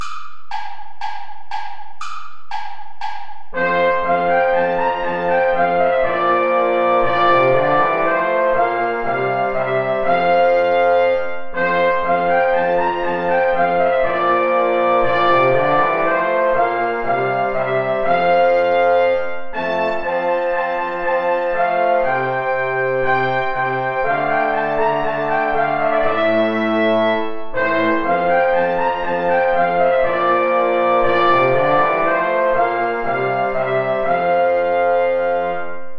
mit lieb bin ich umfangen play along without trumpet; steuerlein johann